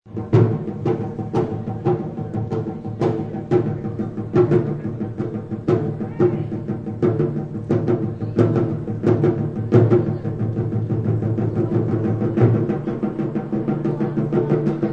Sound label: Taiko Drums, Taiko drums being played at festival.; Concerts